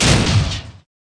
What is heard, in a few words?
metal